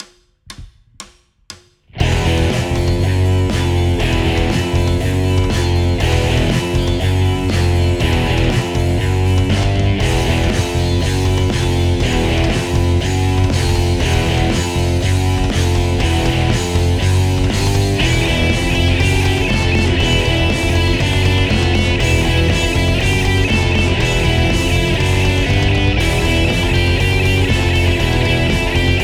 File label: Example Heavy; Die erste Übung